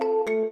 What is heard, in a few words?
disconnect